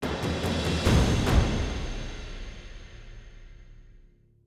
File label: Combat Stinger Percussion